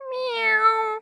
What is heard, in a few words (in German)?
Miau; crosstales; RTVoice